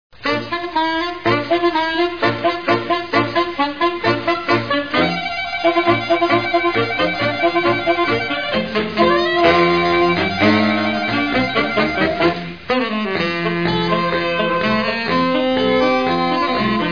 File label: saxes